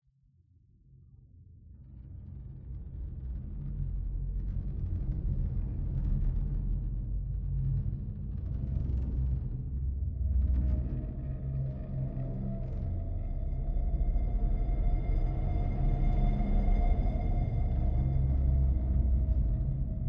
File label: Cave Atmos; Hellblade: Senua's Sacrifice